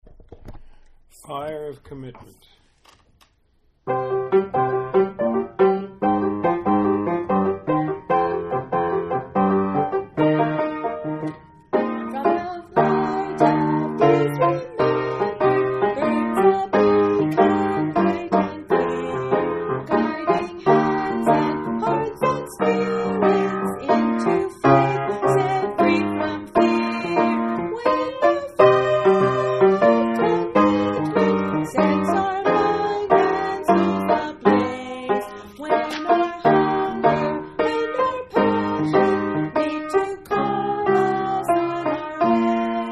alto, choir

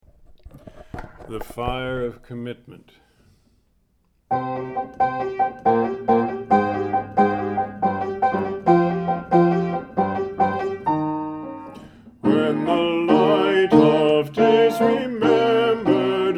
tenor, choir

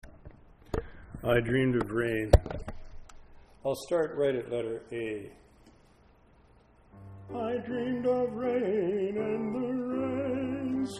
tenor

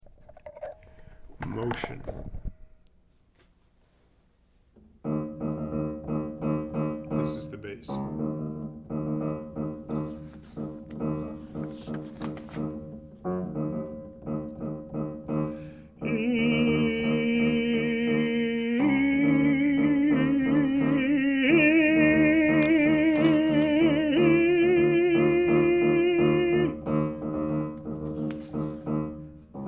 tenor, choir

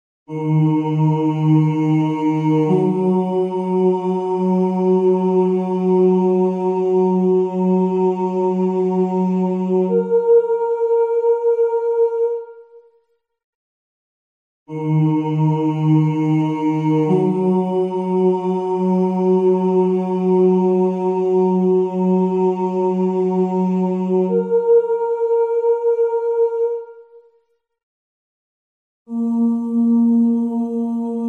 soprano

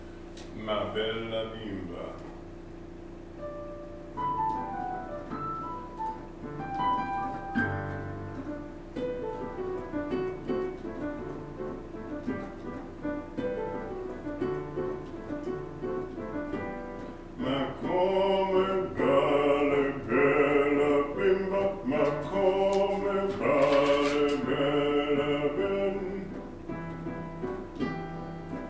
bass, choir

